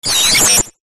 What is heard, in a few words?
science fiction robot glitch; zapsplat